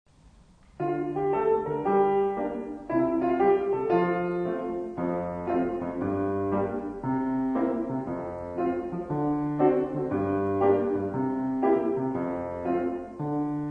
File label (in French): bonjour bonsoir instrumental